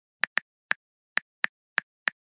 Mobile phone texting

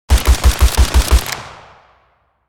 HK416 assault rifle short burst

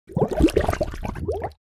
Cartoon bubbles

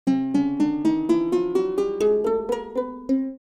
Cartoon ascend

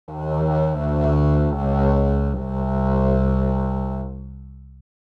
Cartoon fail

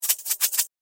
Salt shaker shaking; cooking

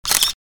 Screenshot pop snap screen; shorts sound effects

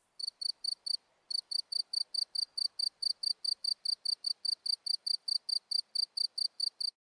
Cricket chirping individual

